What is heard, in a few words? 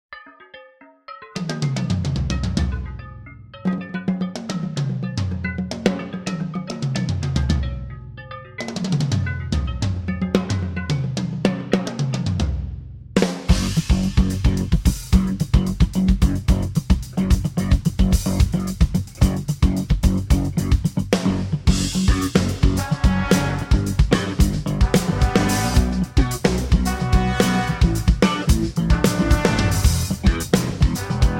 Dark Wind